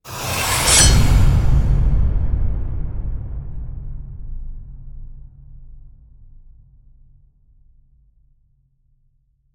Swish Hit Deadly Blow

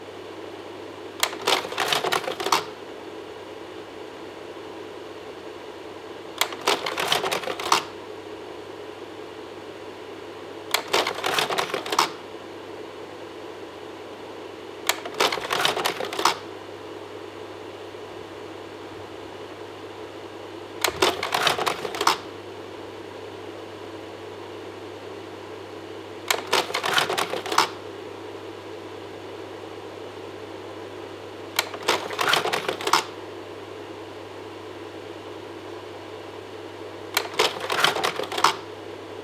Slide Projector Reflecta

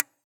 Violin

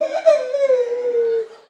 Voice Choking Yyyhh

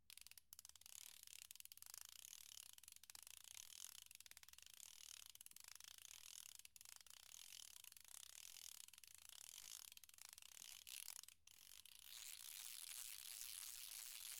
Fishing Controll

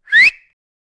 Whistle

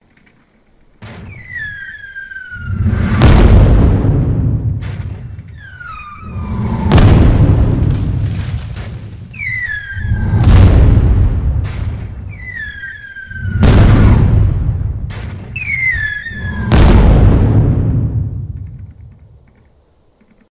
Artillery Fire

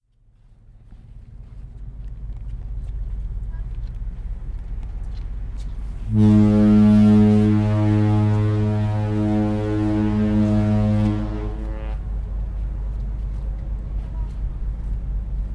Oslofjord Foghorn Stern; Colorfantasy

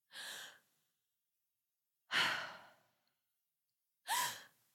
Womans Breath And Shock

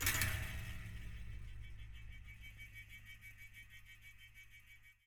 Colide

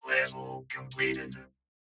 Level Completed

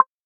Perc Bip

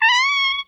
Meow

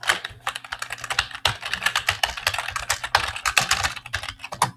Old Keyboard Typing

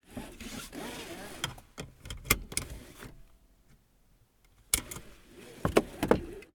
Seat Belt

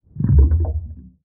Underwater Blub

